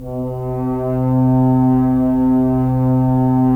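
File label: Solo French Horn, Brass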